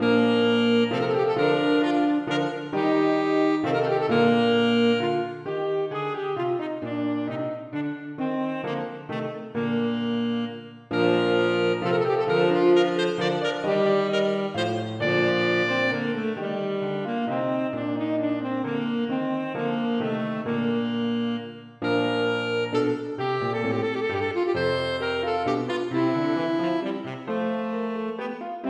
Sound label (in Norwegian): Menuet for altsaksofon, tenorsaksofon og piano; Aamodt 015g